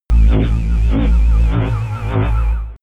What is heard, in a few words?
alienation